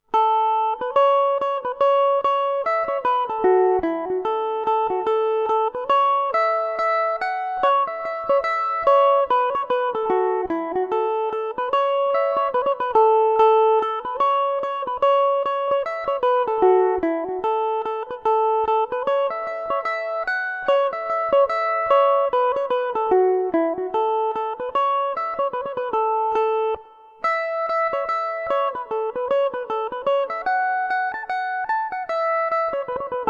dolly varden dmaj, mandolin

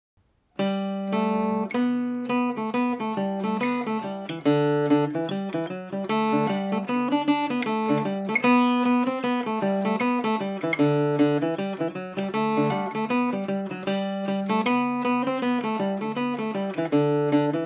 flanagans banshee gmaj, mandolin

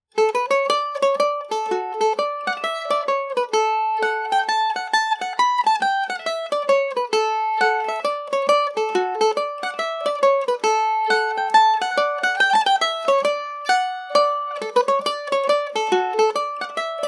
manchester hornpipe, mandolin

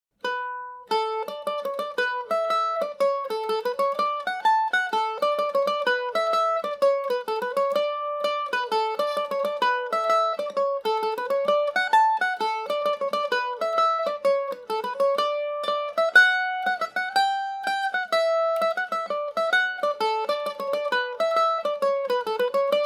dalaighs slide dmaj, mandolin

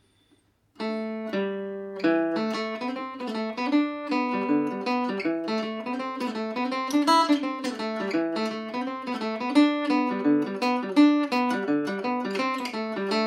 ador, mandolin

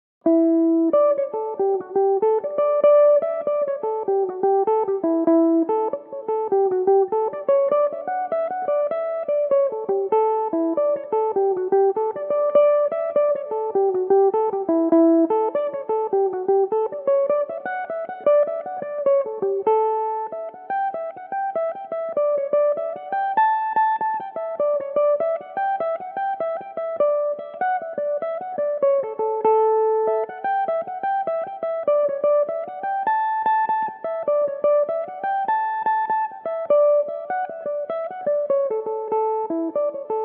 jig, D major, mandolin; Neary's; Belmuse EM-190 electric mandolin

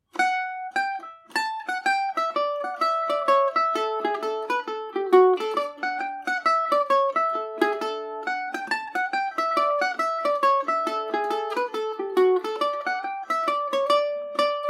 captain johns hornpipe dmaj, mandolin

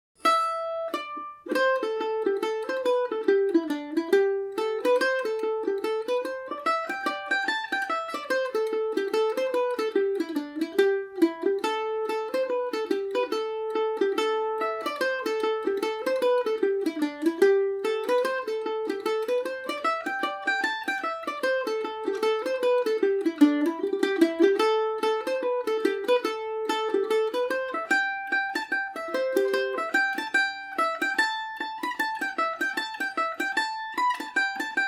birds hornpipe ador, mandolin; Paris Swing Macaferri mandolin; Listen to me playing The Bird's Hornpipe on my Paris Swing Macaferri mandolin

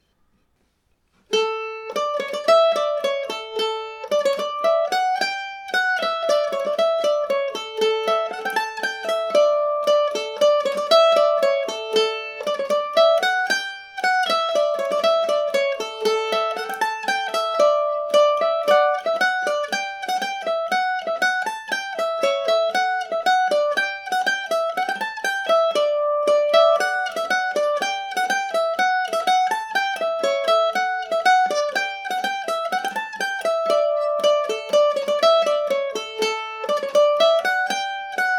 polka dmaj, mandolin

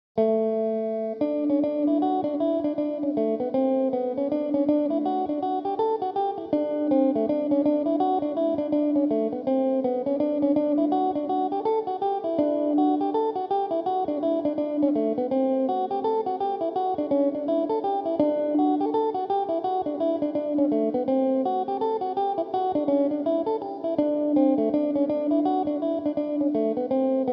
D maj tenor caster, mandolin; Eastwood Tenorcaster electric tenor guitar; The Kindred Spirit

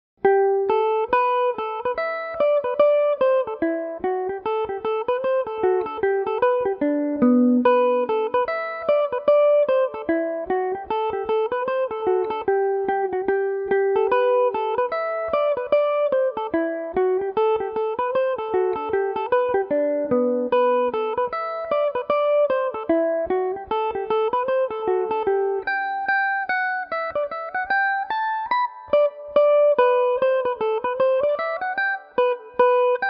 james gannons barndance gmaj, mandolin

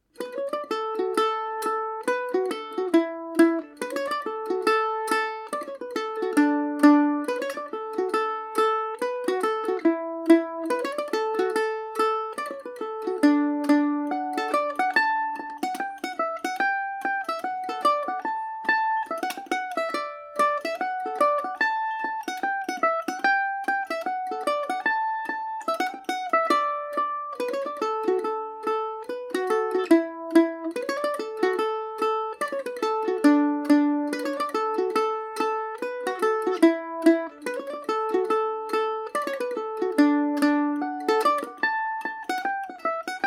denis enrights slide dmaj with plectrum, mandolin; daddario chris thile

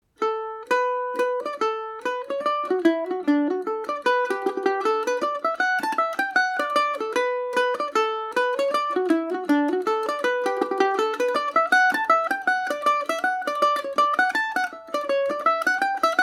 holy land dmaj, mandolin; The Holy Land; Paris Swing Macaferri mandolin

